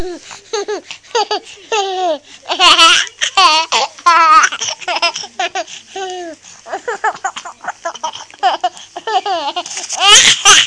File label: baby giggles